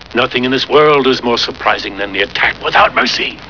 attack

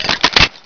reload

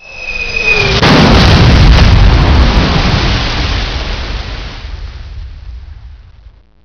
boom

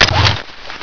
chain pull

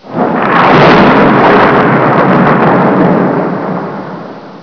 THUNDER

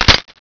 tommy reload clip in

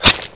knife hit

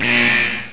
Alarm

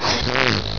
pffffurt

